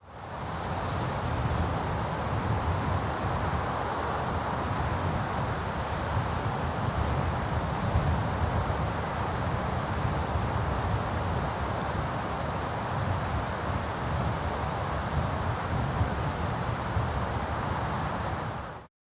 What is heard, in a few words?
Atacama Wind